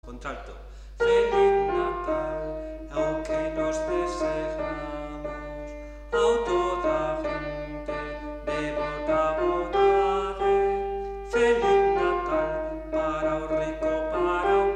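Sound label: alto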